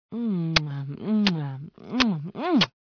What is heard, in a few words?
Mmmm kiss